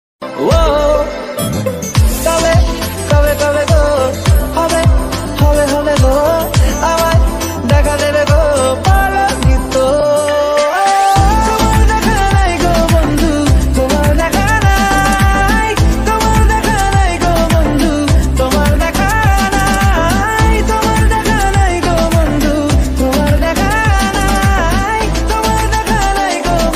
bengali ringtone

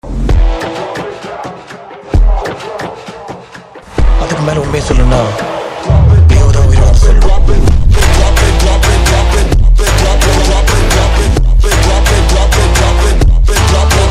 Phonk BGM ringtone

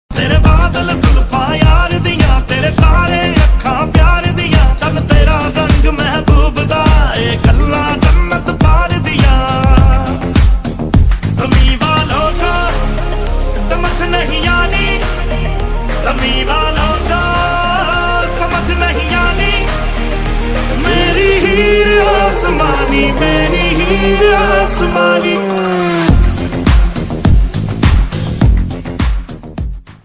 hindi ringtone

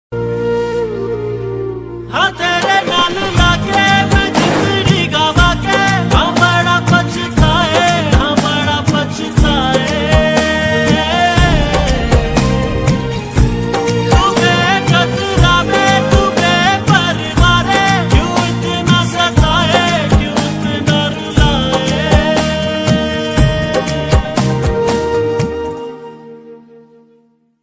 Ost Pakistani ringtone

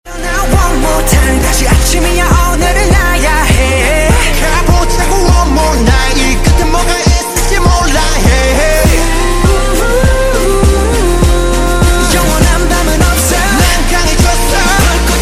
korean ringtone

